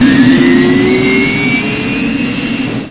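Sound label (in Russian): звук запуска двигателя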